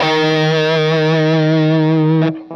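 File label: fuzz guitar vibrato; MAYFUZZ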